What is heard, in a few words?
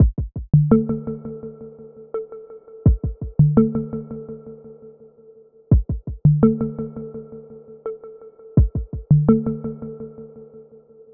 168 BPM D Min Percussion; Melody Loops; Always Yours; Cymatics; ANALOG EVOLUTION Beta; RnB Collection